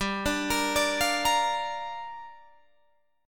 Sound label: G Minor 7th, Guitar, Drop D